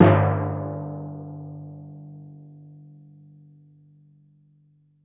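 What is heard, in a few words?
timpani fortissimo G1; Best Service; Peter Siedlaczek's Advanced Orchestra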